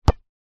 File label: sport soccer ball kick